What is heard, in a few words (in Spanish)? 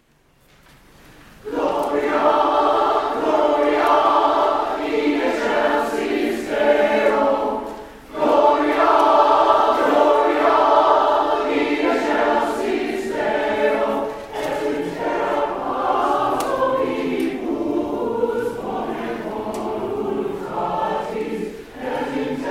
voces